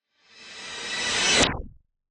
Swell Metallic; bottlerocket

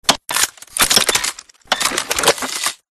MECHANICAL MORPHS; PMSFX

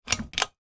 household fan ceiling turn on or off; sound effects one

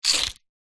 vehicles keys car pick up from surface

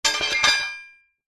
sword set down on stone ground

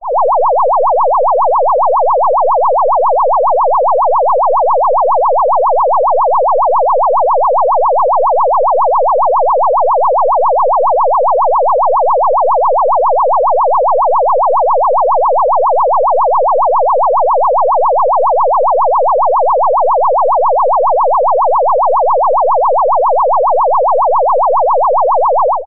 emergency siren car alarm designed; zapsplat

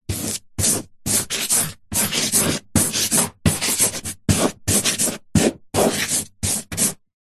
office chalk writing on chalkboard; zapsplat

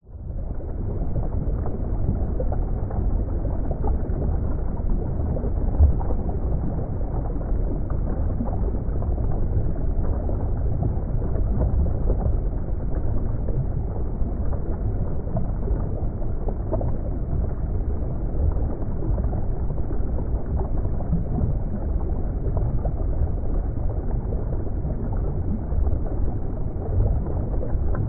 underwater ambience flowing current deep; nature; zapsplat

